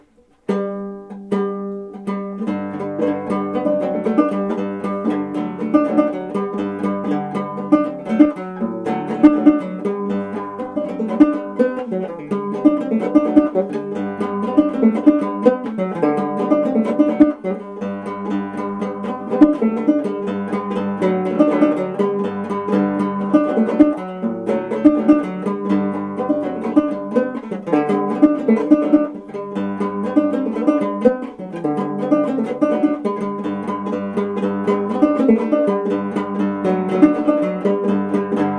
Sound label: coon hunt, gourd